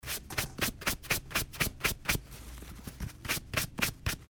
brush

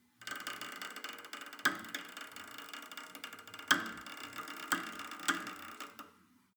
cable machine creak down

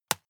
juggle catch medium

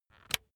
razor attachment extend

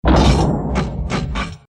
heavy steel door lock

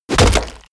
club impact crack wet

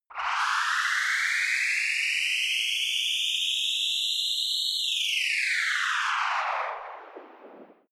fast ufo liftoff failure

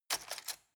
cutlery plastic drawer grab hard

